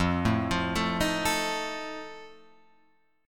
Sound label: F 7th F down Guitar Open F; F7 arpeggiated